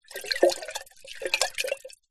household teapot ceramic tea inside movement splosh around lid off